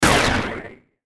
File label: Hit Sweeper Flange Distortion, Sweeper Swell Fast; Production Element Imaging Element Accent Transition; Blastwave